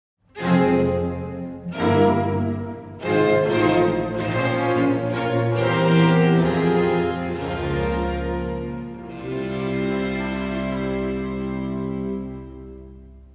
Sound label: Concerto 8 in G minor 1. Vivace; Arcangelo Corelli; Corelli - Complete Works; Klassika